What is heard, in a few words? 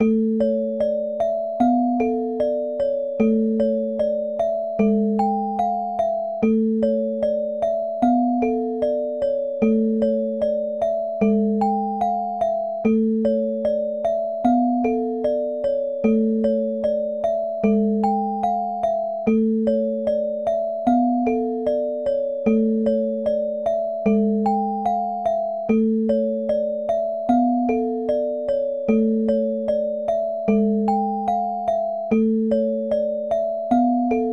bgm orgel slow